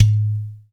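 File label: percussion udu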